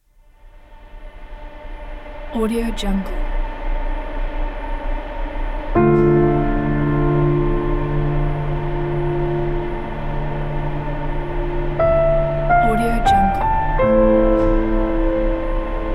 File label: cinematic goodbye; cinquequarti